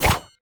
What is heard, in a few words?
Attack Jump; bloom minigame